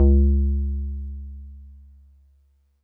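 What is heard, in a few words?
Wood Piano DX100 C#4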